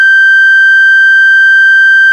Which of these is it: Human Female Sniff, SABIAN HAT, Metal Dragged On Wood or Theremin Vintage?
Theremin Vintage